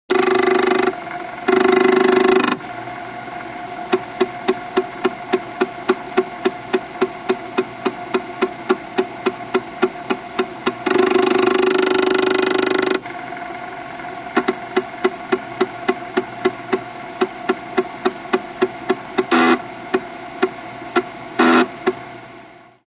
Insert a floppy disk into the floppy drive, read the floppy disk, take it; computer